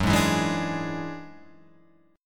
F sharp 7th Suspended 2nd Sharp 5th, Guitar, Open F; F#7sus2#5 strummed